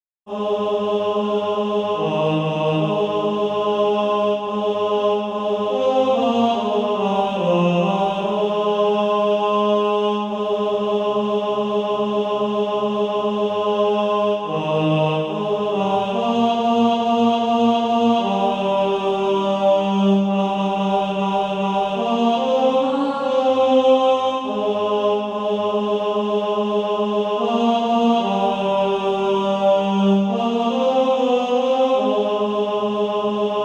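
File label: tenor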